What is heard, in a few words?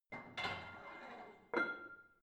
barbell plate put on hit ring soft; sport freizeit; fitnessstudio